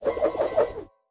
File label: twirl; cstrike; valorant; neofrontier sheriff